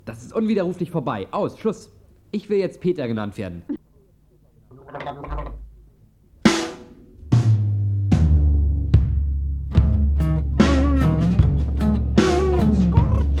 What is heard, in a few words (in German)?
Kassette digitalisieren Testaufnahme